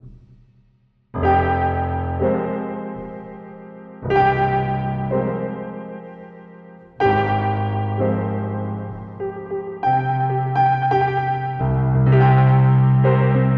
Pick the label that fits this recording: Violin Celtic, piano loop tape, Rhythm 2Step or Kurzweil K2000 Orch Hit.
piano loop tape